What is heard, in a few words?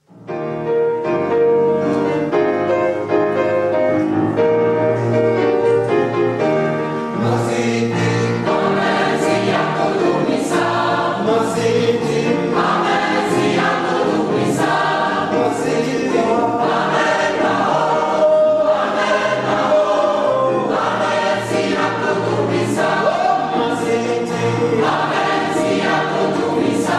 Gospel Singers